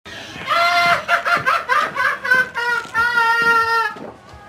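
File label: joker laugh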